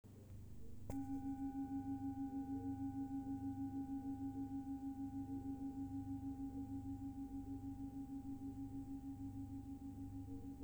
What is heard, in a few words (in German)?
Antik Schale